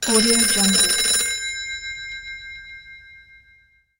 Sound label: phone ringing; impulssound